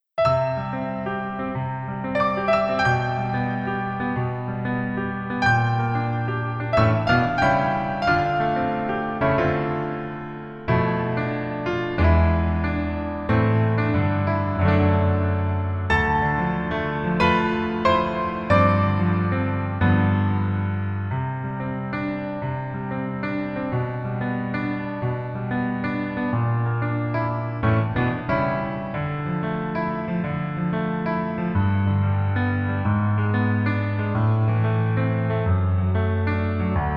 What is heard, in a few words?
Piano ver